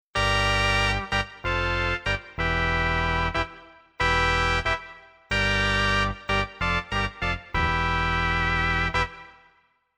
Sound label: Arp synth